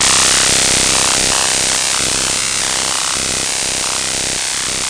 electric and annoying; Electro and Synthetic

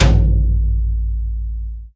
TT lo Brush kit; STYLE KITS (Hip Hop, Etc)

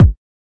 kick

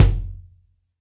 bombo kick; Kicks; single hits

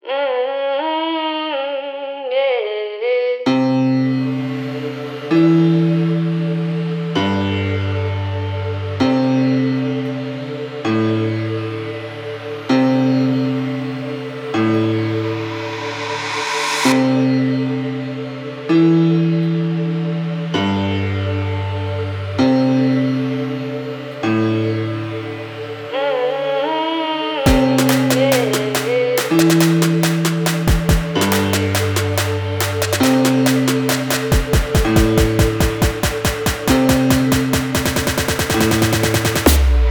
guitar rap beat

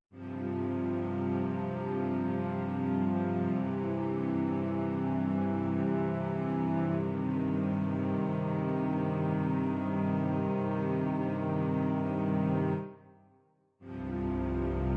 140 orchestral intro

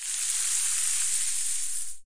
rainstick

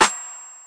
Clappy Bell; drums; hip hop; STYLE KITS (Hip Hop, Etc); Khezie Hip Hop Kit

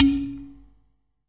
Big pot, Clay Pots, ETHNIC and WORLD PERCUSSION

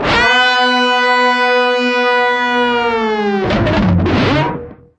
dual guitar dive

